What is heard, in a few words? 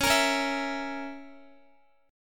C sharp Minor 6th C sharp down Guitar; C#m6 strummed